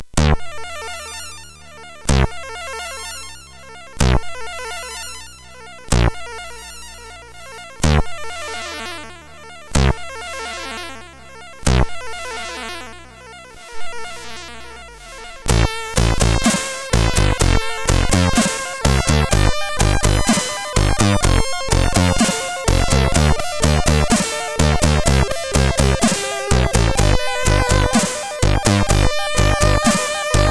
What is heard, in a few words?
end, sid CSG8580R5